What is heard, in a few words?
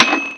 typewriter bell; tuxpaint